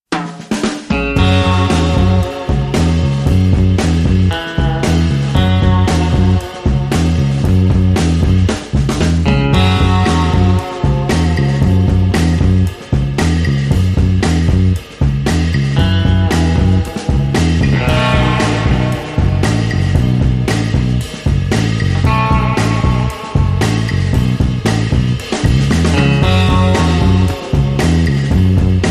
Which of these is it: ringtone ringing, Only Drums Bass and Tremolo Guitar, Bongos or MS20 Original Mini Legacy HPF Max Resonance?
Only Drums Bass and Tremolo Guitar